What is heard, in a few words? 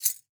Rusty Shaker; World Percussion; Cymatics; Emperor World Percussion